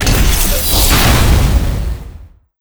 boss dreadnought satk; steamer crazy

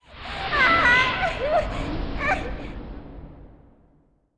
ghost woman death, monster

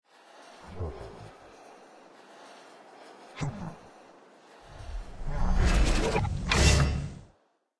guardian idle, monster; legacy guardian

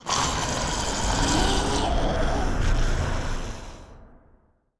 ghost trap death, monster

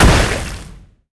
mech naga explo; monster

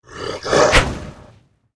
vampires attack

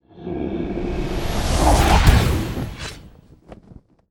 lesser demon; monster

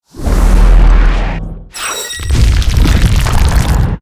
arcane golem death

